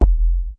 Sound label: clock the bass, Kicks; single hits